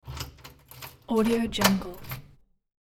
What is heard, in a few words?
lock dead bolt with key; hollywoodedge